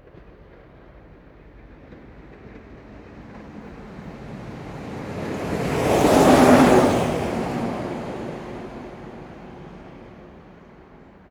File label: train fast passing; best service Blue Box